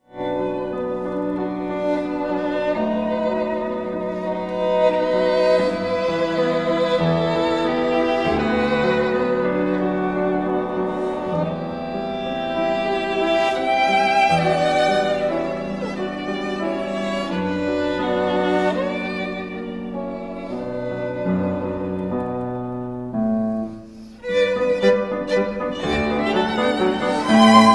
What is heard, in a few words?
fantasie in c major for violin and piano; Franz Schubert; D934